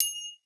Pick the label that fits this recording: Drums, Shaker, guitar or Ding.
Ding